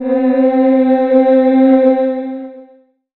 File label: pad, Dance Synths